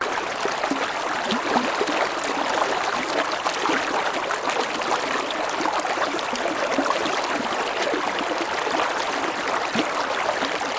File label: Stream Medium